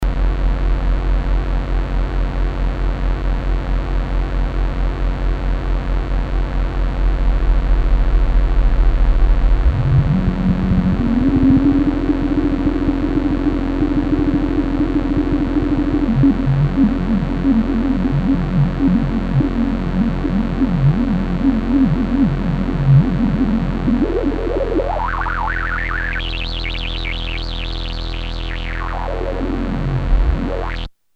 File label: drone; Red Sound Darkstar